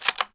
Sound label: mac10 boltpull, weapons; cstrike